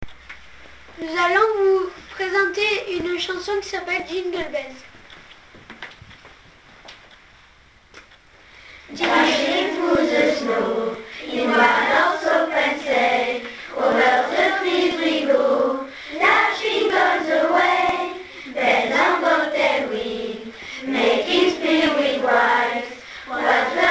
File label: Jingle bells; Vive le vent; Dashing through the snow, in a one-horse open sleigh Over the fields we go, laughing all the way; Bells on bob-tail ring, making spirits bright What fun it is to ride and sing a sl